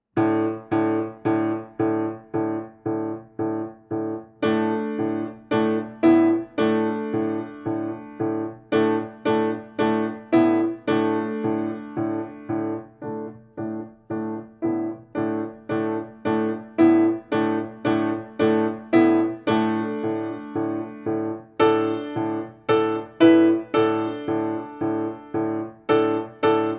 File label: Saguaro Stomp 112